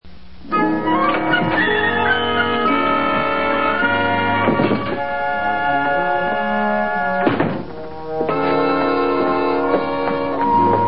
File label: music